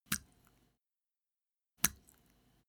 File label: step barefoot wet hard short